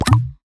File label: error; FirefoxReality